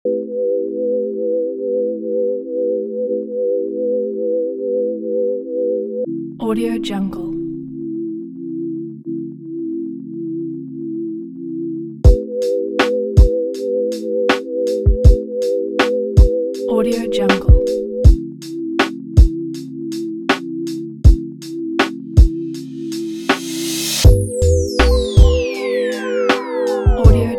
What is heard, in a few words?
lofi chill beat